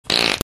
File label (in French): pet